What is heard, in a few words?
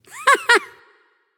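"heh heh", nonverbal; smite; voicepack; khepri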